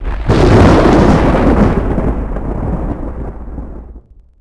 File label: thunder